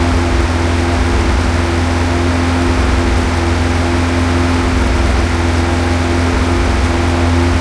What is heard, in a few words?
m4a3 engine int rpm 99 load, tanks; War Thunder